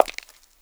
pavement, footsteps; 1000fx

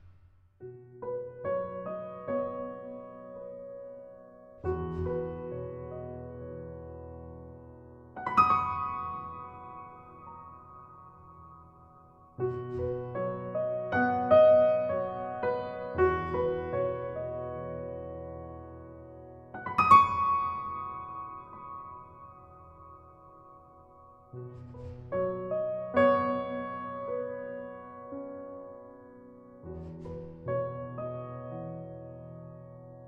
improvisation for piano and bird

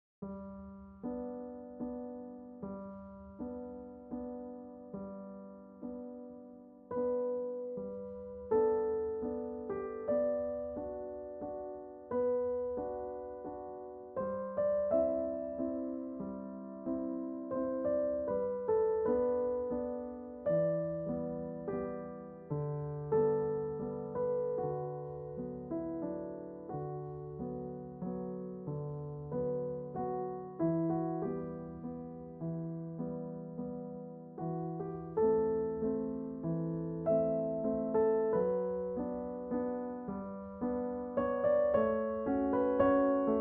Sad Waltz

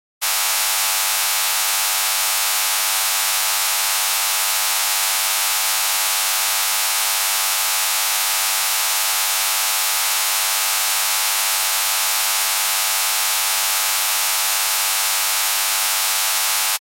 Laser Medium-Pitched Blast; Electronic Sci-fi

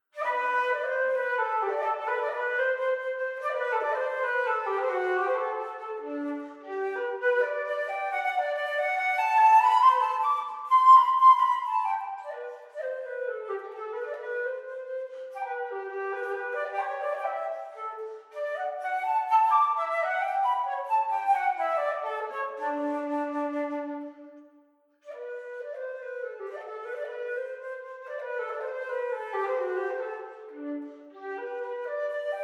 Menuet II; Partita no. 3 in E Major BWV1006; JS Bach

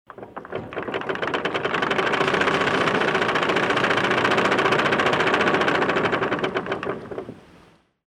Anchor Being Lowered; SOUND EFFECTS1

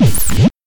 impact

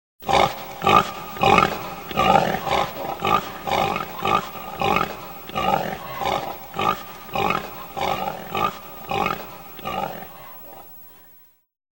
pig grunting; SOUND EFFECTS1

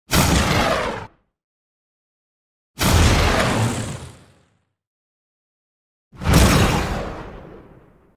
Explosion - Fast flare x3; Hollywood Edge Sound Designers Tool Kit

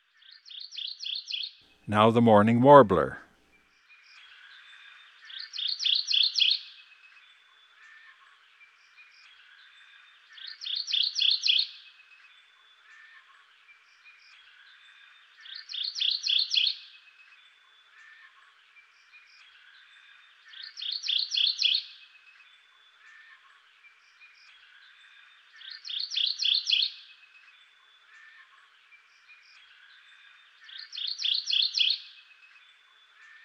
Mourning Warbler; Eastern North America; Dan Gibson; Wildlife Identification By Sound

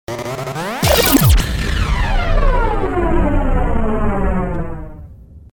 Boomerang Rocket; DJ sounds

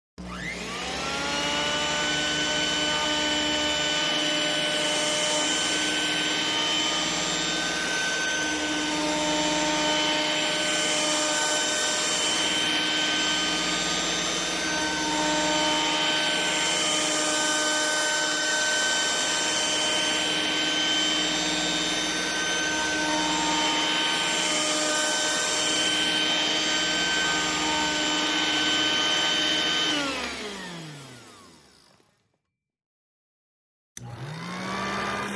Vacuum cleaner; SOUND EFFECTS1

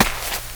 DIRT, FOOTSTEPS; 1000fx

